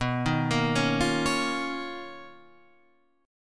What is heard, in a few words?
B Major Sharp 11th, Guitar, Half Step Down